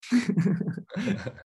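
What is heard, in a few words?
small laugh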